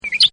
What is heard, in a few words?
BIRD; Partners In Rhyme